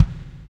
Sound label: KIK, Reverbs; Club-50 - Foundations Roland; Verb 9